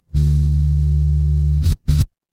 fire electric hum

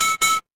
feedback success

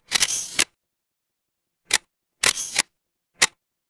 office camera

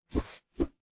transition swoosh

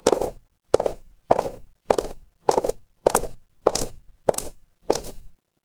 footsteps heels

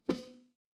cartoon poof

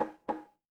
impact wood knock

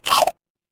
cartoon chomp

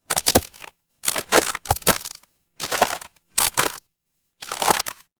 footsteps gravel

